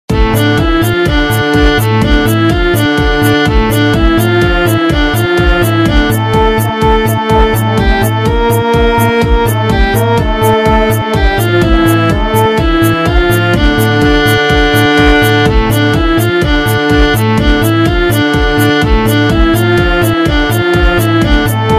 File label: bella ciao instrumental short ringtone; money heist